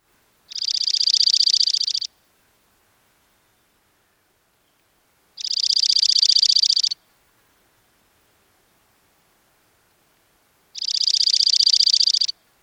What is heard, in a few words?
song